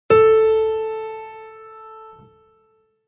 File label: instruments piano ra; maoudamashii